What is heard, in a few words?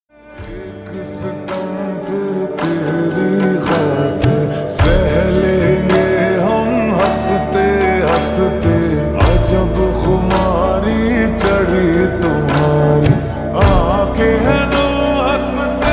Slowed Reverb Ringtone